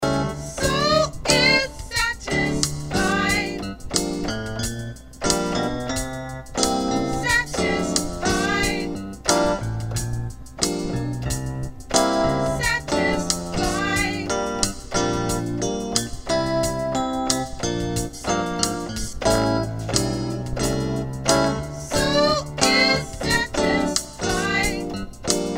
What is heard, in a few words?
Soprano, choir parts